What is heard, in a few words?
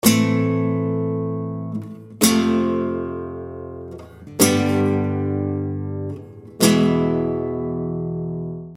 Guitar Chords Hit Single